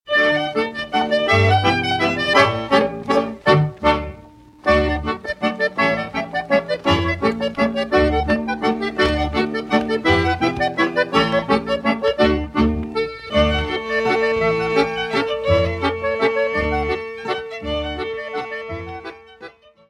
Black Forest Mazurka - Allemagne

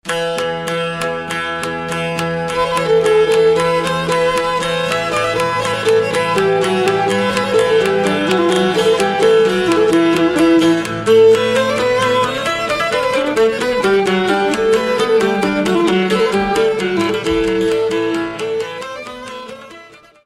Syrtos - Grece

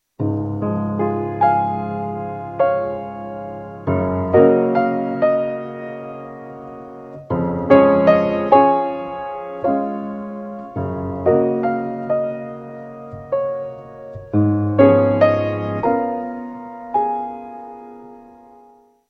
piano a queue; instruments

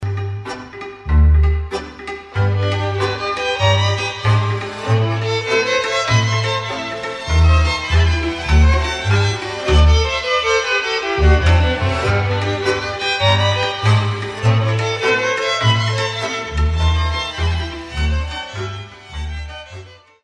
Hora - Roumanie

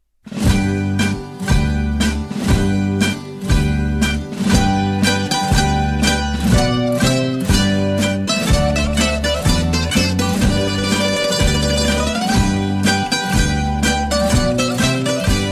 Sirtaki - Grece

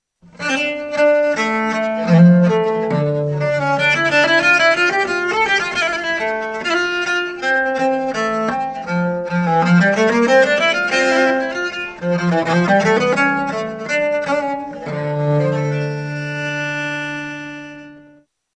viole de gambe

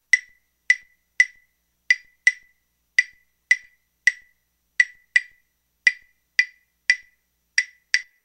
instruments claves

